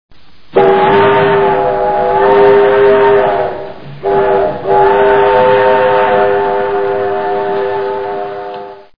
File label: train whistle; High Noon